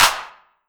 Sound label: CLAP